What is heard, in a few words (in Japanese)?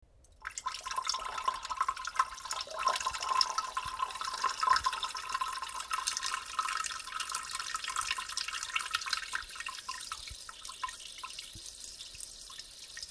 ちょぼぼ寝起きおしっこ